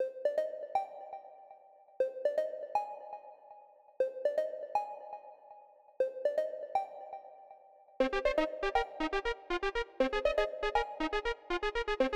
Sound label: trap beat